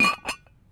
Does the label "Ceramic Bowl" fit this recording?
Yes